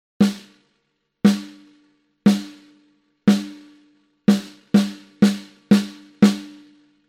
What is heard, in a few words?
snare 100 sample delay